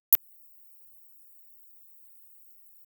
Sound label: 3d spatial button click